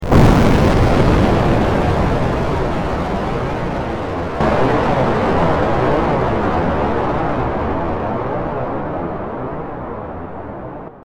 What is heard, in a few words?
Loud Thunder